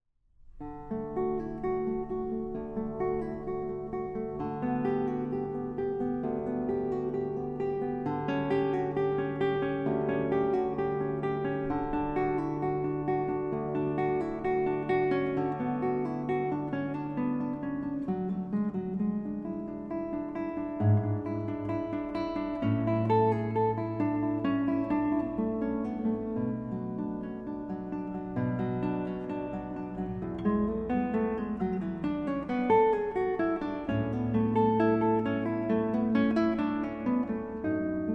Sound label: Cello Suite No1 Prelude; Bach